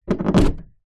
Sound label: household door pull out remove from plug wall protector; zapsplat